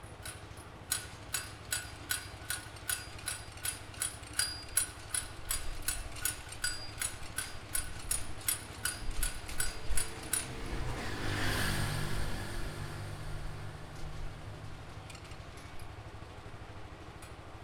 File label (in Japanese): 工事中